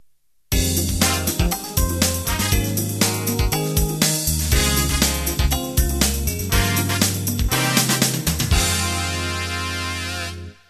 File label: Acid Jazz End